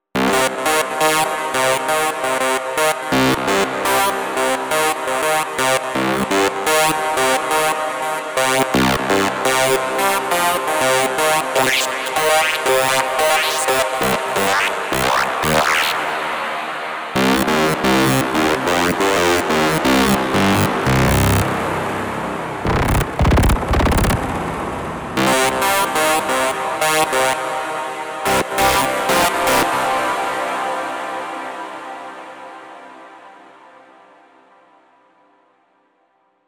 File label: Tone2 Icarus